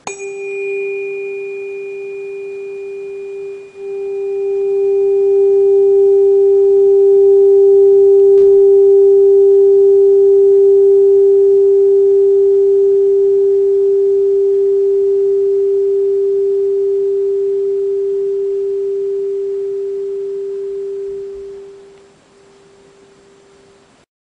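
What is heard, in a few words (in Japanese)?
396 Hz, 音叉; 第1チャクラ 赤; 罪・トラウマ・恐怖からの解放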